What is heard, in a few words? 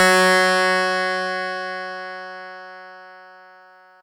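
Fuzz Clav DX100 F#0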